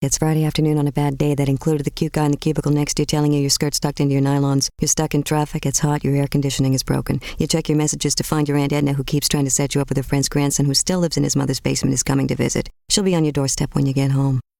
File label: bell bad day